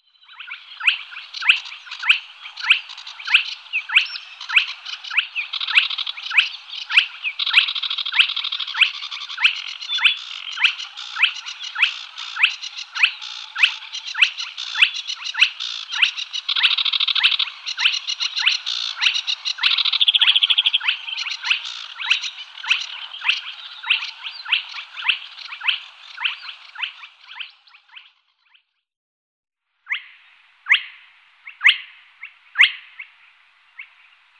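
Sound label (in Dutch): porseleinhoen, vogelgeluiden; Zang en andere geluiden van in Meijendel broedende soorten; hdykstra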